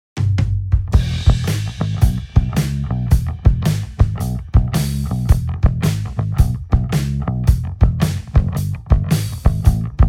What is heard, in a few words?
blues no guitar; solo guitarra